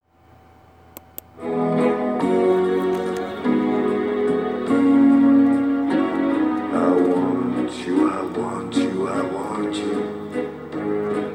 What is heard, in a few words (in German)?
Walzer